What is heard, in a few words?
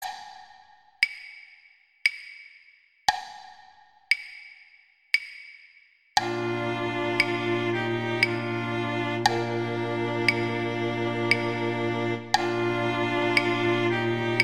Viola, String; Gruber; Silent Night